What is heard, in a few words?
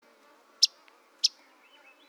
yellow rumped warbler